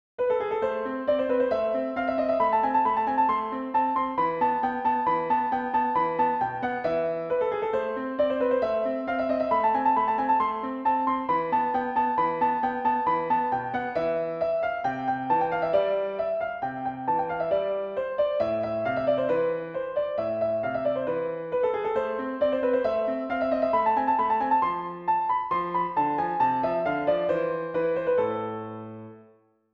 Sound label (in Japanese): トルコ行進曲（ピアノ）